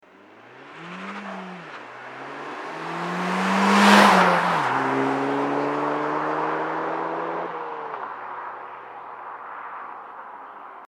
vorbeifahrt, Catback-System Golf VI GTI Abgasanlage; Serie

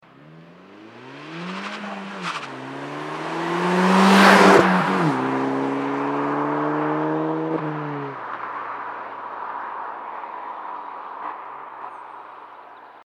vorbeifahrt, Catback-System Golf VI GTI Abgasanlage; APR

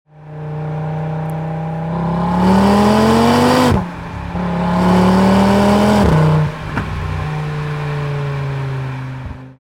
Audi RS 3 Sportback 8V, Abgasanlage; AGA; Fahrt; stoll sport

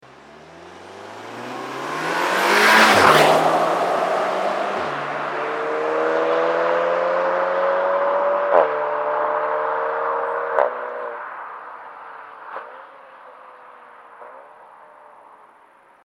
R Variant OPF Klappe ZU Vorbeifahrt; catback-system golf vii r variant cupra r st 5f; Abgasanlage

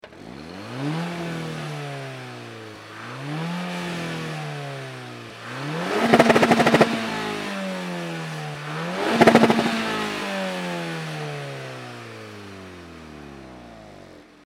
Golf VI Serie Stand, Abgasanlage; catback-system golf vi gti

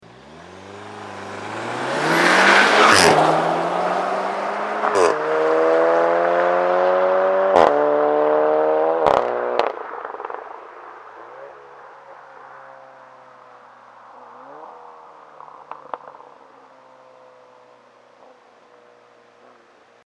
Vorbeifahrt R Variant Vor OPF; catback-system golf vii r variant cupra r st 5f; Ohne OPF; Abgasanlage

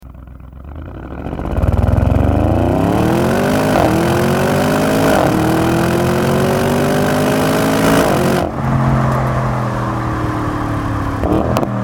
Fahrt Klappen offen, Audi RS6 C8 Abgasanlage; stoll sport; Fahrt Klappen geöffnet

